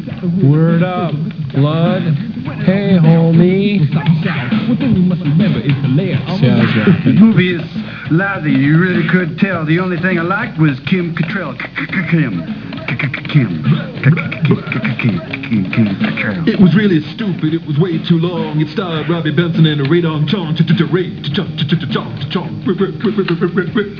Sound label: RAP